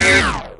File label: type hit